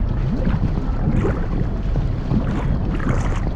Lava Bubble Loop

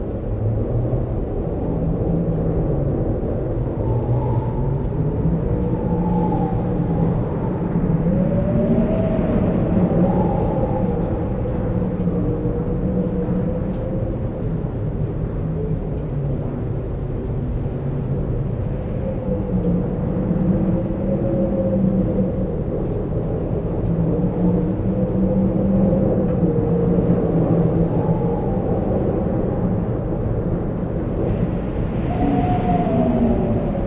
Wind graveyard

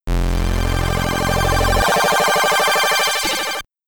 Stage Start (SID Stereo); Dragon Breed; Commodore 64

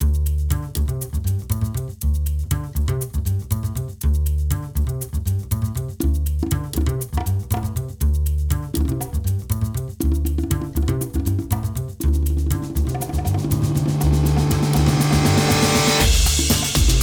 Radio Electro; Gangstar West Coast Hustle; Android

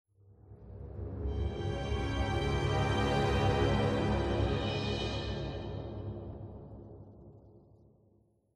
Hospital Stinger Crystal

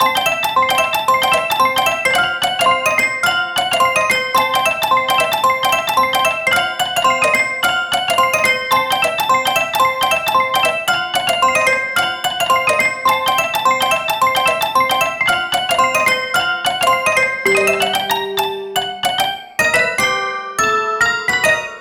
MUSIC DANCE MODE; VENTRILOQUIST; Lego DC Super Villains 2018 Nintendo Switch

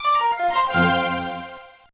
Complete, gba

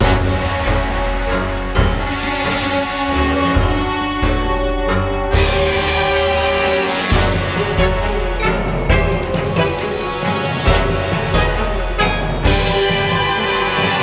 Pirate Battle; Lego Battles 2009 NDS

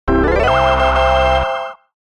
Win; The Sims 2; Nintendo DS; Ratticator

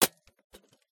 Radio Hit

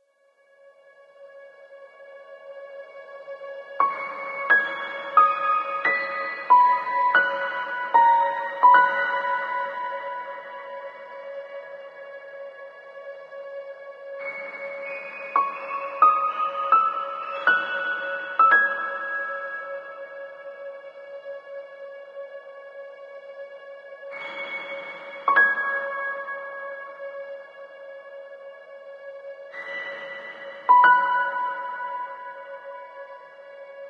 Village Sting; ether one redux gamerip 2015

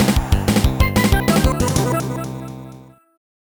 Bonus; soccer kid 2002 gba

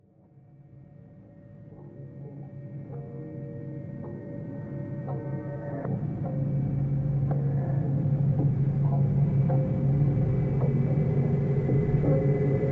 Tick Tock; PC 2020; Five Nights at Treasure Island

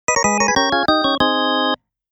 Miss; arcade disc in jaleco action

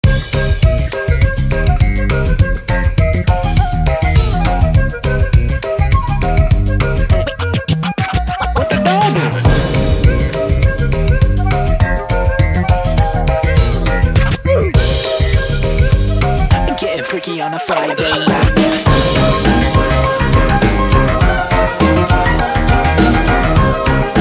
Menu Theme; A Nonsensical Friday Night; macOS; Windows

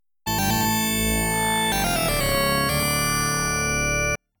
Organ Unlocked

